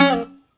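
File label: bouzouki glissando down C 3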